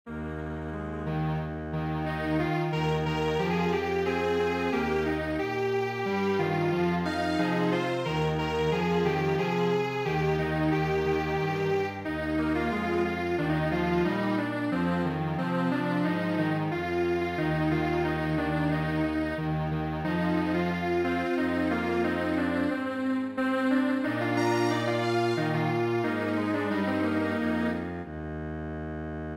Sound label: Strings Quartet